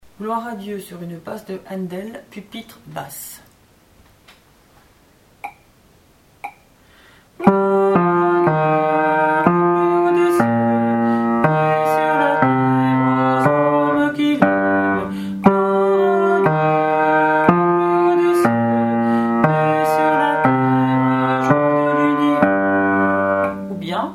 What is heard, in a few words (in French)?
Gloire à Dieu sur basse BASSE